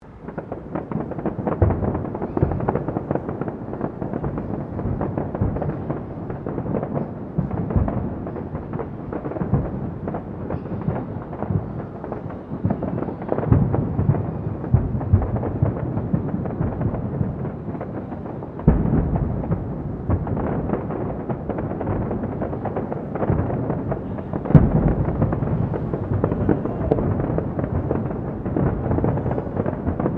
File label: Ambience Fireworks Distant, Fireworks in City area recorded from a distance; Firework Sound Effects; Foundation1